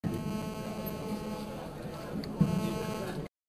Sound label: loop